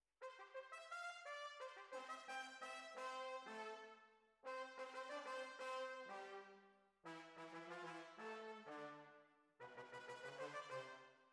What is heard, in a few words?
Horns